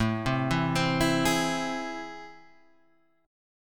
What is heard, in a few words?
A Minor Major 7th; {5 3 2 5 5 4} arpeggiated